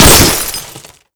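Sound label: bullet impact auto glass; Modern Warfare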